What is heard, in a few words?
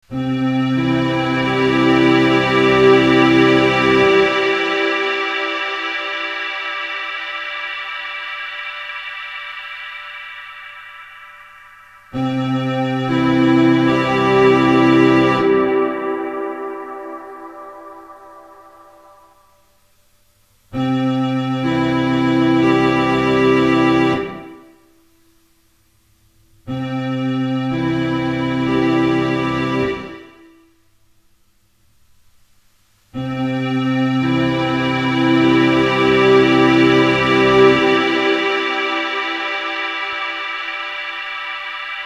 room Orgel